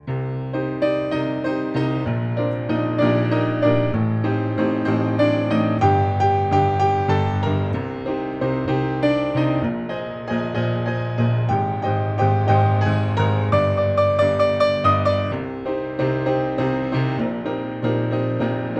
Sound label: Piano DPA4060